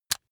razor rickety fold out

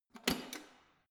letterbox metal unlock

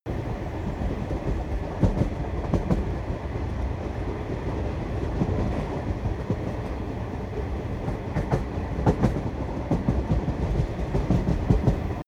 train wagon interior drive slow loop

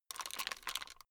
plastic rattle fast short

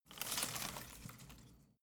home plant leaves rustle soft

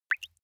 single drops falling

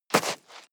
step snow walk single

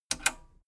metal button radio click disable fast

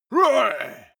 male shout hit

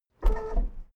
car lift up short heavy

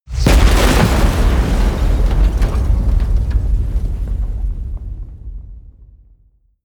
detonation

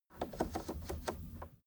car temperature knob turn short slow

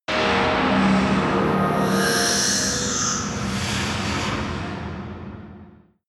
whoosh scifi hiss

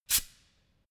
pneumatic pump short

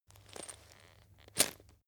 chain mail movement

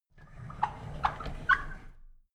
drawer big squeak open soft

